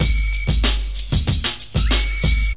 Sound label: 93 bpm thump, drum loops and breaks